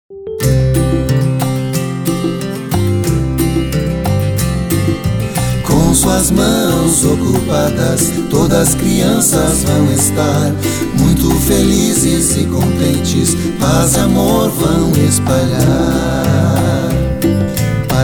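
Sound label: Song, Portuguese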